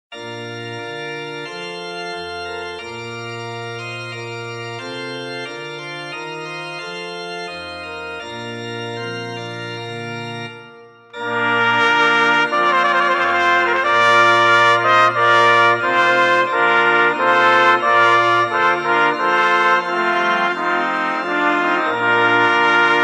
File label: trumpet voluntary, 1 trąbki